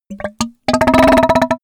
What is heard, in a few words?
goblin fart, comedy cartoon; soundpool